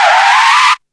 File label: CAR STOP